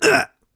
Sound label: Player Pain; liblast